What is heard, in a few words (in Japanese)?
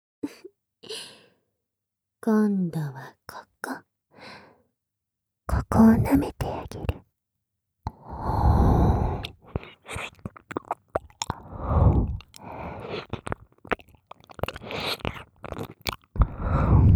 ねっとり囁き耳舐め; ねっとり囁き耳舐め手コキでザーメン搾取♪; 効果音あり